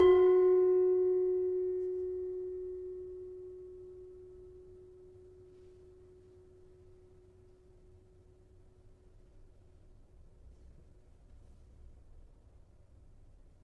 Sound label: Demu 4 F Sharp, gravity harps tuned